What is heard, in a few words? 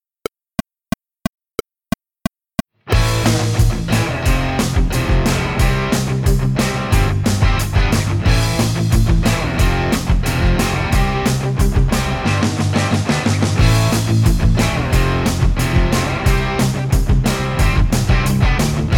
With drums